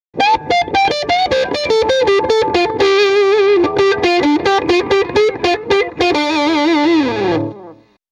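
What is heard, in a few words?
m75 lp bridge pickup no tone jtm45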